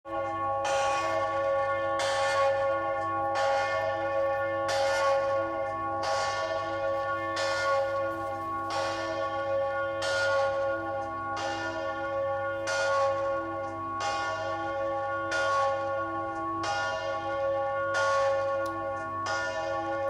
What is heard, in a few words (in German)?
Nikolaikirche Glocke